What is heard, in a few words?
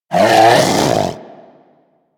Predator Vocal Alerted, Hunting Critters; Warframe